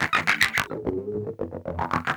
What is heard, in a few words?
Wah; Best Service Gigapack 2